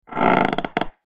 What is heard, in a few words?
creaky floorboard